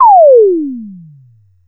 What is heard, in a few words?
TOM KIT A4, TOM SYNTH; AKAI; USB Soundscan vol.20 - Fresh Disco House I